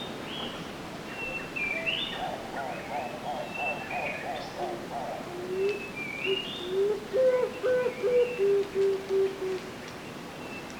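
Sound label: Columba delegorguei, pigeon; vinno krasnyy golub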